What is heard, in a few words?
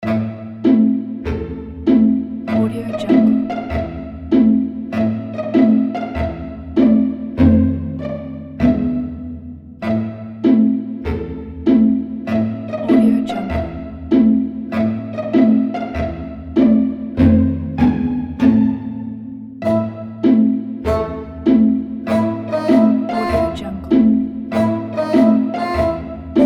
funny